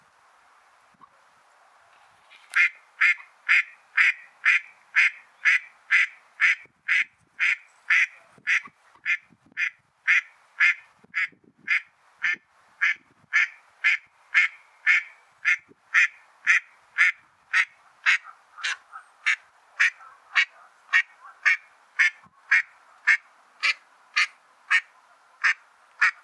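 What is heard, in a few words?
mallard anas platyrhynchos; kryakva; utok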